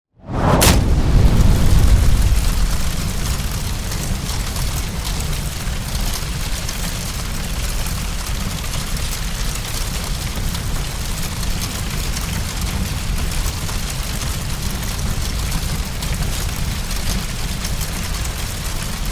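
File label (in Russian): вспышка и горение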